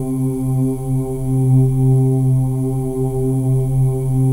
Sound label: M+F OO C3; Spectrasonics; Symphony of Voices; M+F OOS